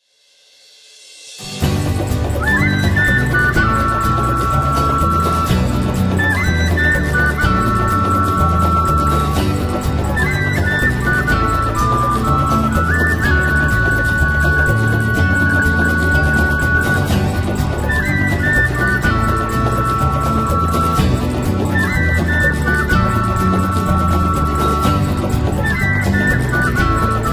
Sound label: sea birds